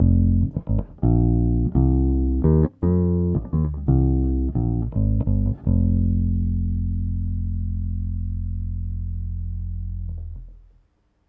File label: Bass Ending, 70s Analog Funk; Sonic Foundry (Sony Creative Software); What it Is! 70s Analog Funk